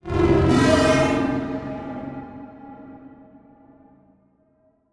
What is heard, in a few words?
Transition Whoosh Fire; Production Element; Title Transition; AdobeCC